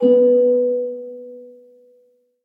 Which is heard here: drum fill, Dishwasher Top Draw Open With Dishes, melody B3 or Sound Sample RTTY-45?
melody B3